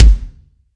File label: drum hit normal